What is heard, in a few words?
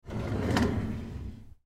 Drawer Open, Drawer Open Bathroom; Drawers; Household; Blastwave